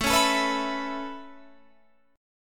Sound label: A Major Add 9th A, chord